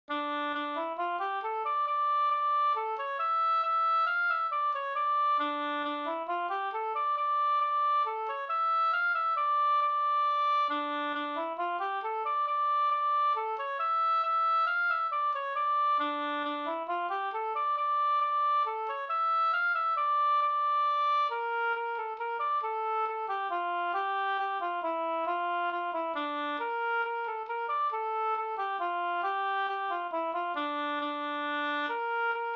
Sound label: mazurka Tornedalsmazurka; Svantes mazurka